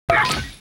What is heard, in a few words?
war idle; Mob